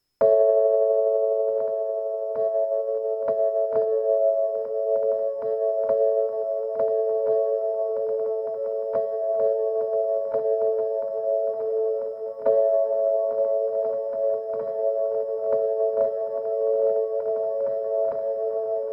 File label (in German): Soma Cosmos 1 Uhr